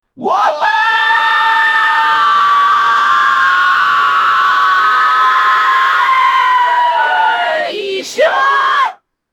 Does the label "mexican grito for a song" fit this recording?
Yes